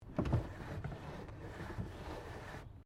CAR HOLDEN FE WINDOW MANUAL OPEN, Classic Australian cars; Car Holden Fe 1957 Window Manual Open; Cars (Sound Effects:Vehicles)